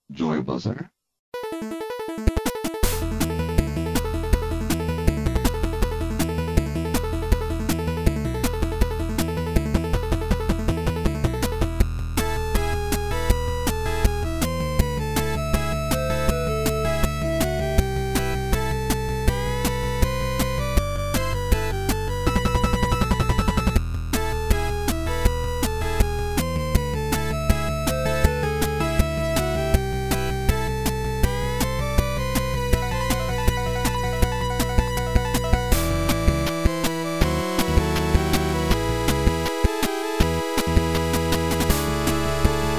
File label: 8 bit mix